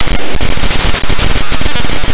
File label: prc, percussion mix; house; PS-28 Vocal House Samples; Drum & Perc